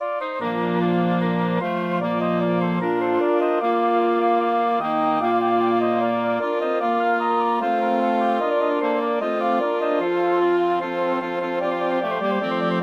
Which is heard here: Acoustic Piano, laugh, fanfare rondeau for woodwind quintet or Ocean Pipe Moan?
fanfare rondeau for woodwind quintet